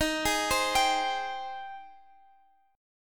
Ab Major 7th Eb

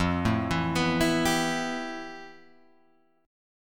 F Major Sharp 11th, Guitar, Open G

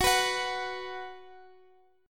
B 5th F sharp, chord

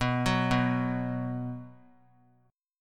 B 5th B, chord; arpeggiated; JGuitar

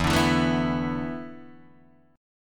E 7th Suspended 4th, Guitar, DADGAD

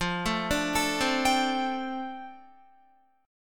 F 6th Add 9th F down Guitar, chord

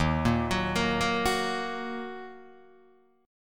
D sharp Minor D sharp down Guitar Open D

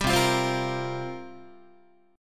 C 7th Suspended 4th, Guitar, chord

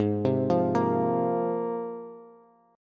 Ab 6th Add 9th Ab Bass; arpeggiated; JGuitar

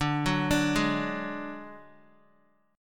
D Major Flat 5th D Guitar, chord; arpeggiated; JGuitar

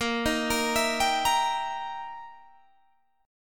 E Minor Major 7th Flat 5th, A sharp

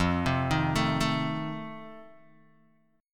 F 7th F down Guitar Open D

